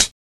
original high hat B key, foot cymbal tuned to the B note; hat samples